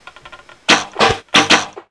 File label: BOW SHOT